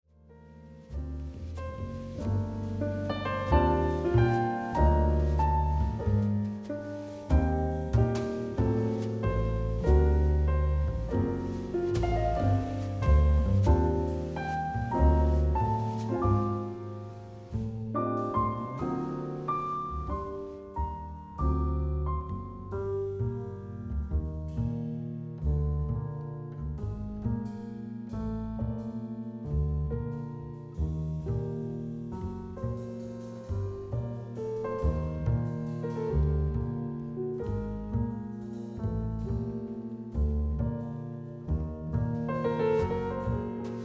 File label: Jazz mix; Savant Audio QRS; Quantec QRS